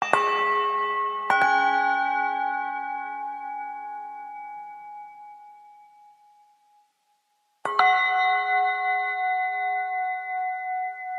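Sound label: Village Bell music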